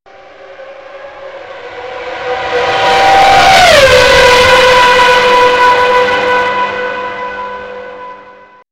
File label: F1 Sms; Sms Tones; Ringtones